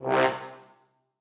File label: Horn Stab